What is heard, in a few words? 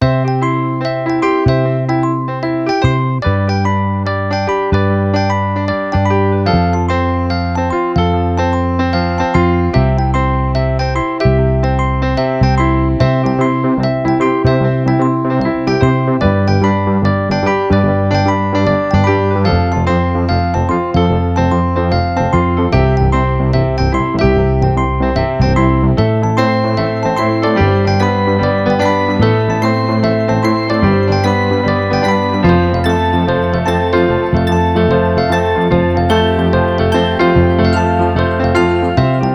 just happy without drums